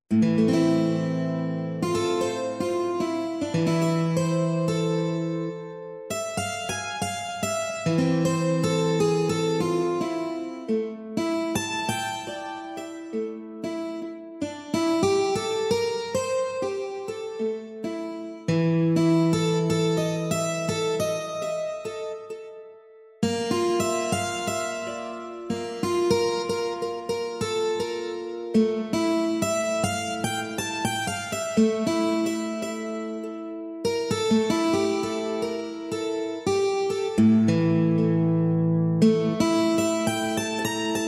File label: ACOUSTIC LUTHE STRINGS